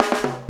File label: JAMMAR FILL; AMG Gota Yashiki Groove Activator; JAMMER 120